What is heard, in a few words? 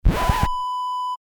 record scratch